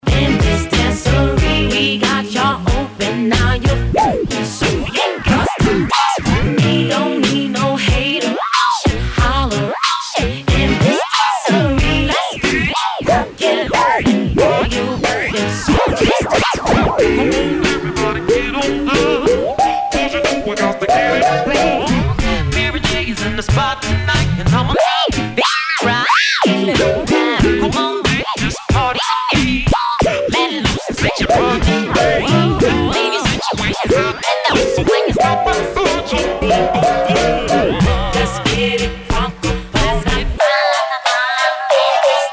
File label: scratcher